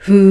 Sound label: VOX WHO G3, Scats Choir; 90s Sample CDs; Club-50 - Foundations Roland; Short Scats